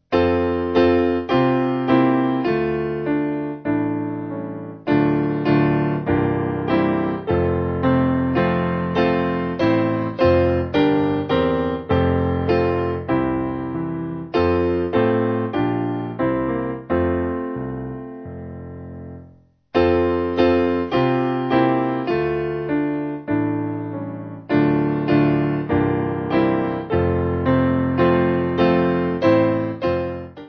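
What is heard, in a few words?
Piano, church music backing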